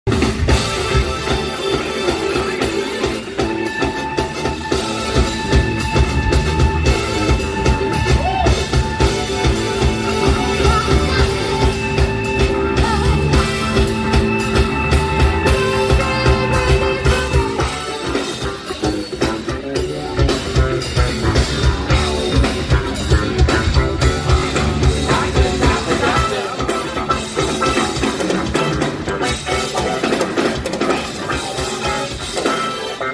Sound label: funk